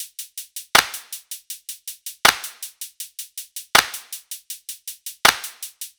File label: Hihat and Clap 80 bpm; Loops; Drums; Top; Ableton Live 12 Lite; Core Library